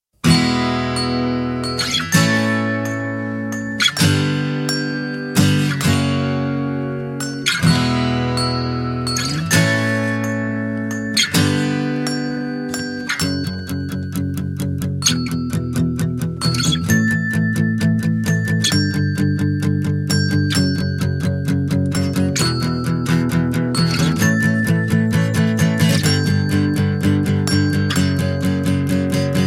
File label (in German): Die Glocken